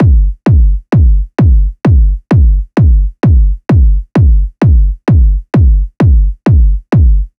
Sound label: Kick Loop 130, Techno; Ghosthack; Free Techno Sounds 2024